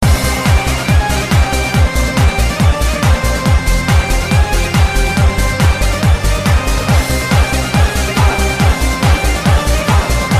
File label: bagpipe